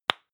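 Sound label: lipstick open hard, Bathroom; haus-garten; badezimmer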